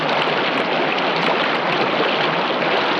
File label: stream